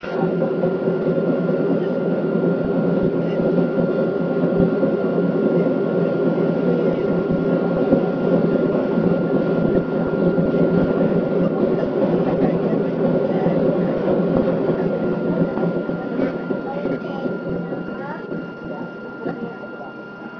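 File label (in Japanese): 箱根登山モハ1形 出山信号場出発時の走行音; 出山信号場出発時の走行音（2000年3月4日収録）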